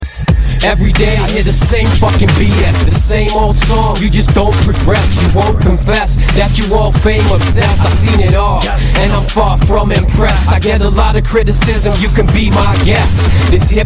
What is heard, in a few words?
Dope Ringtone